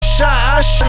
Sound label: shooosh